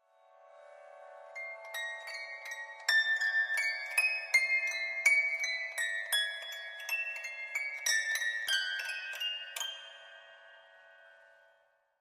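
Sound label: Grand Piano Strings High; keyboards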